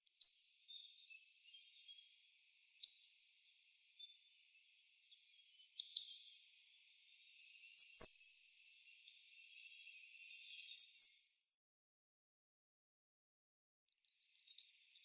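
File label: grey wagtail Motacilla cinerea, nature sounds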